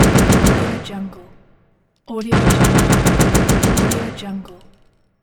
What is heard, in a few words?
machine gun fire with shell ejection